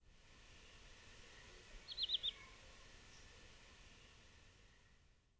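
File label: Wood Sandpiper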